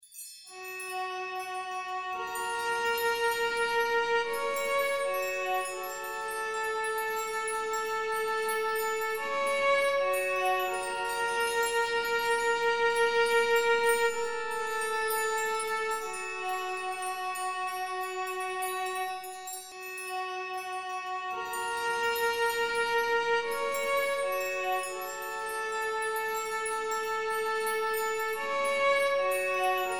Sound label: cello chimes